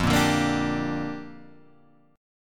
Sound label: G Minor 7th, F